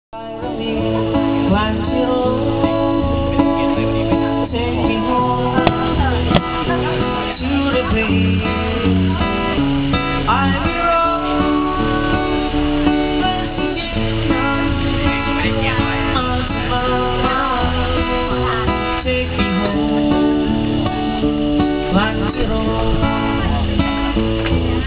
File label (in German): saenger country, Barsaenger in Arany Prathet; thai cambodia